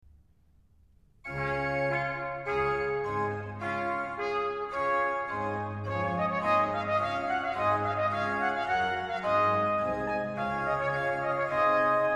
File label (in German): tedeum Chor